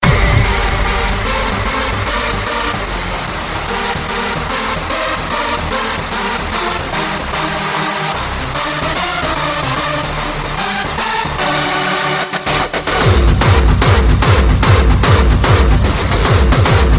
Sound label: hardstyle remix ringtone